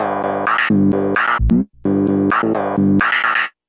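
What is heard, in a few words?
electrocute my guitar synth, 130 bpm; SYNTH AND ELECTRONIC LOOPS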